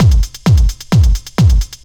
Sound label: straight, Techno Trance Essentials drum loops